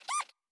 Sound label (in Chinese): 狐狸待机声